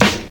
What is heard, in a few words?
00s clean acoustic snare g sharp key